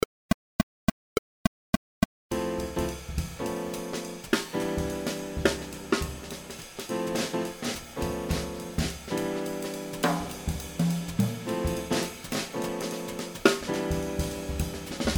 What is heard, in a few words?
Tritone Sub Fast Tempo